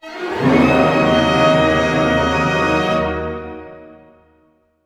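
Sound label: glissando D# minor, Orchestra; Sound On Sound - Peter Siedleczek's Orchestra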